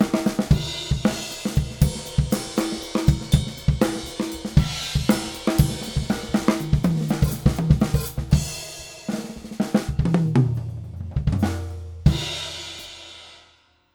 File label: Acoustic Drums - Roomy Drums; TransGainer